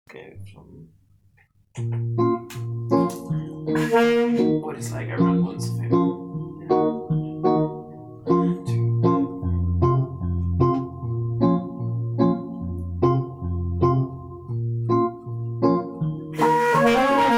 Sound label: trumpet and sax solo